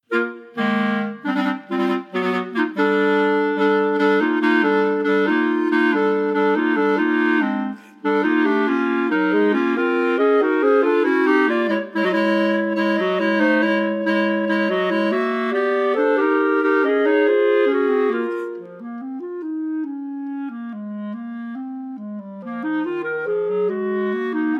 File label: 3 Pieces for 3 Bb Clarinets complete version